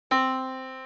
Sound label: Yamaha Disk, piano sound